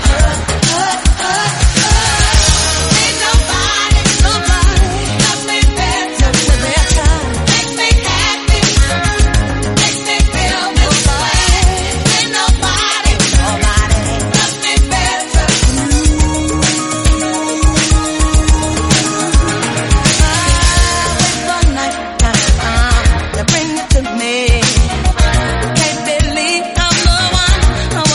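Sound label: REDRUM 105bpm A flat minor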